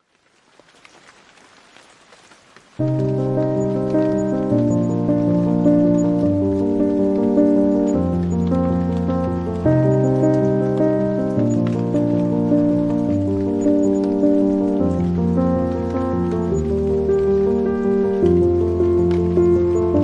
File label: Heartbeat Piano (With Rain), Calming Piano Music with Rain